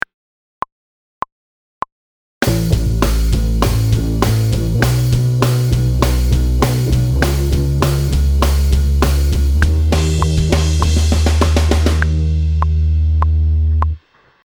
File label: no guitar; tapping in harmony; rock